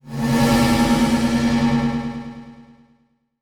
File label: Transition Delay Medium